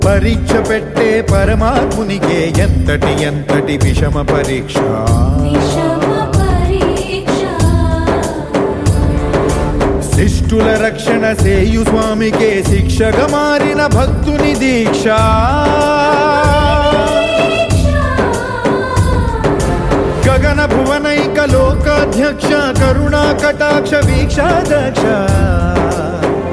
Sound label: Telugu Ringtone